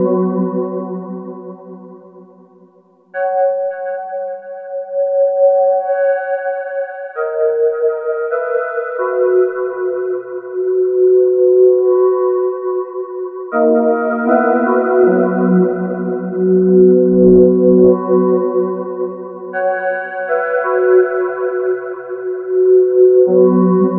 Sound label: Nice Pads, Electro Lounge; Sonic Foundry (Sony Creative Software); 080 Pinky